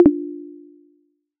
check off; Performance; AlexGreeningham

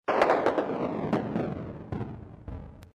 fail sound

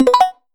match confirm; Crimson Stella

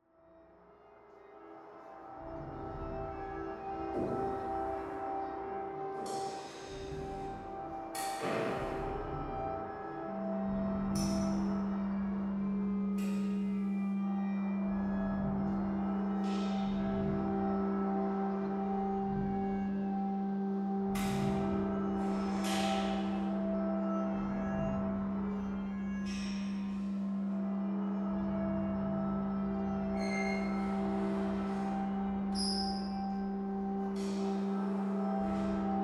linoleum, flute, oboe

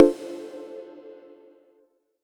menu freeplay click; megamix

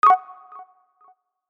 match unready; « DEKU » - MHA

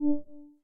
menu edit click; Luxariah Default Minimal

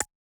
normal slider tick; AriizuCA's Skin V3.17.6 Tail Yellow

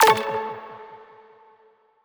menu click; Crimson Stella; osu

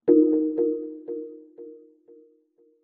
pause back click; AriizuCA's Skin V3.17.6 Tail Yellow

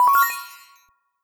menu freeplay click; 『DTMoon』

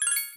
Menu Hit; JesusOmega {NM} 『Planets』

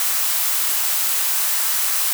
soft slider slide; MonkoPastel

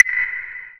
soft hitwhistle; izduh v4red temu

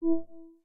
menu play click; Luxariah Default Minimal

